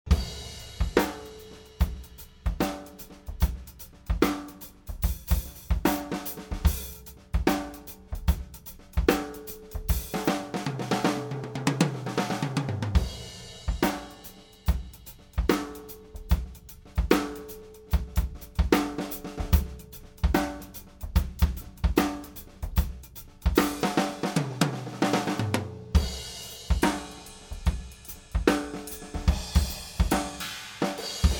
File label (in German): MKH40 OH Kick Snare ganzes Set; MKH40- mit Kick- und Snare-Stützmikros- ganzes Set - flat; Glyn-Johns